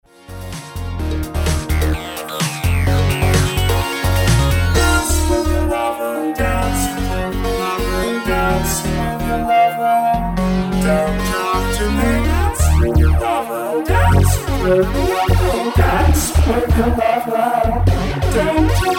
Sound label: Flanger